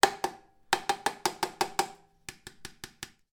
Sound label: household trimmer facial hair tap on sink remove hair; sound effects one